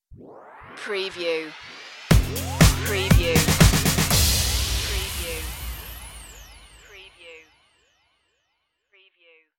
gliding waves stinger

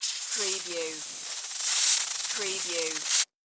Alien Snake Hiss; sound effects bible

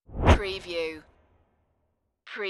Wipe

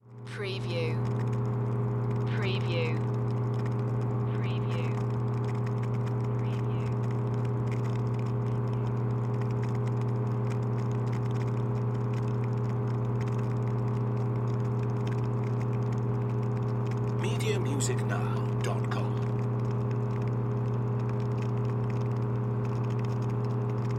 Water Pump Run; Sound Effects Bible

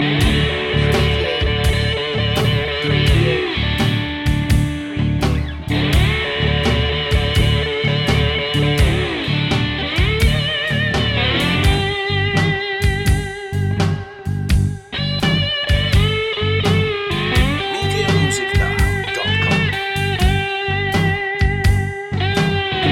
bottleneck blues loop

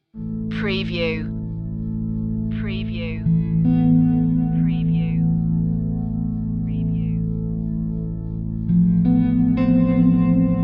dreamscape thoughtful

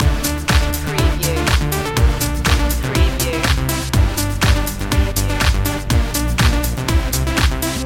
ibiza summer loop

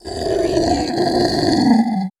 Monster Groan Pain

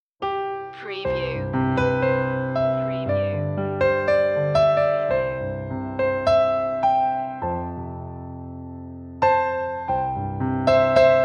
solo piano; auld lang syne; media music now

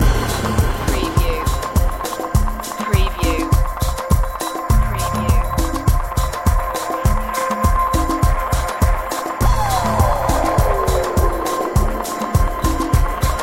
teleportation loop

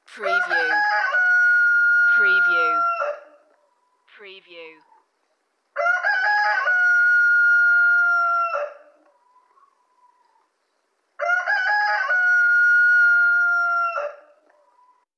Rooster Crowing

